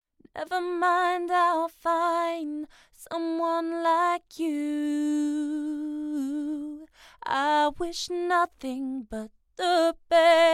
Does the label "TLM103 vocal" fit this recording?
Yes